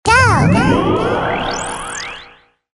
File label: sound go